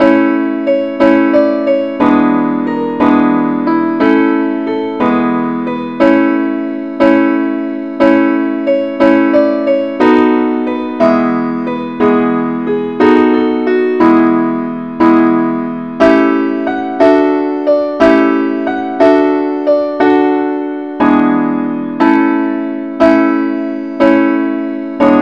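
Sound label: angel voices simple accompaniment key A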